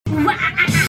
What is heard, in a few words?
wahahahah